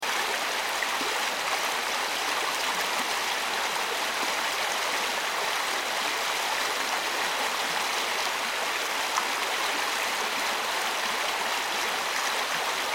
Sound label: water